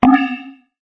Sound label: plinger